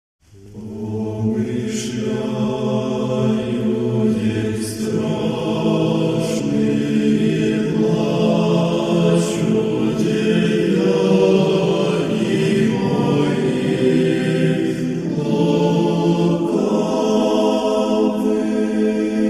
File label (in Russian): m; Pomyshlyayu den strashnyy